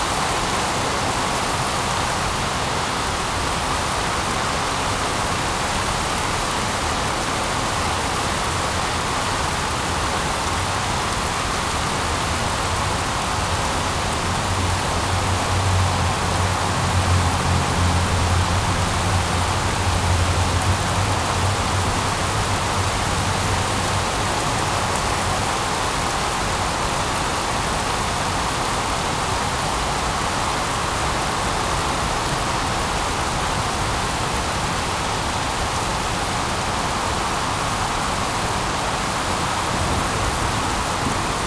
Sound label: Rain heavy, Ambience; Project Gotham Racing 2